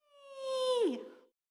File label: Slide Exit; Jackie